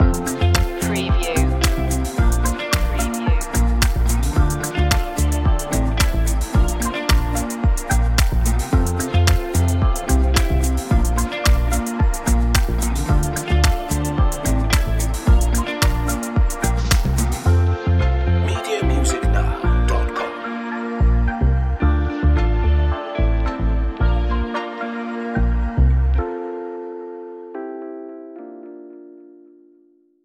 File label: summer fashion house